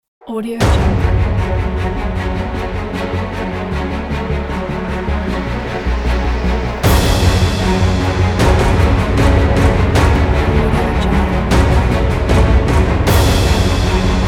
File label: epic cinematic trailer